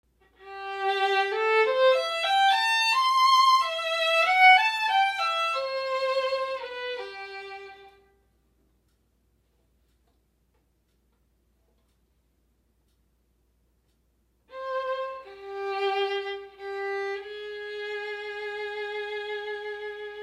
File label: Violin Elgar